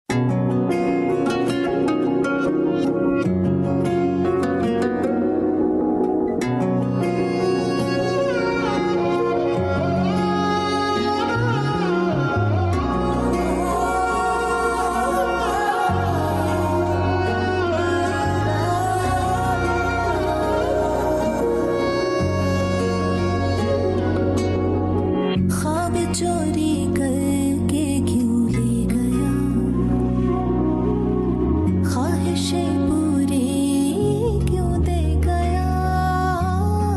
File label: Pakistani Drama OST Ringtone; Pinjra